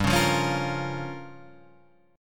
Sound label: G Minor 7th Flat 5th